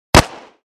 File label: Pistol springfield xd 45 single shot